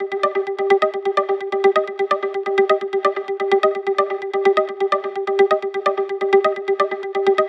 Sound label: Melodic Loop Gmin 128, Melodic Techno; Edition; Ghosthack; AC2024 - Day 12 - Melodic Techno